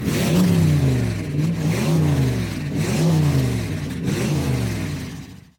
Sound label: Rev Multi; The Loud House - Extreme Cardboard Racing; NextlevelGames